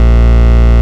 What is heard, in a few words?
Vengeance Ultimate Bass A# Root A#; Vengeance Samples